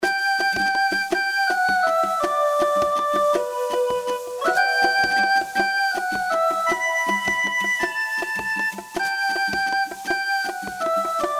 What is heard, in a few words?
spider loop